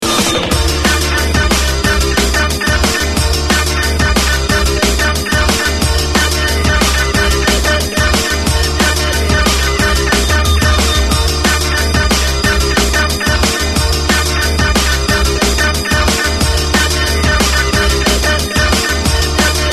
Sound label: breakbeat; jungle